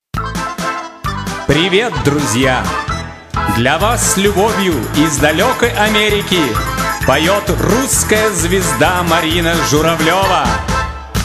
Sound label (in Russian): Igrai gitara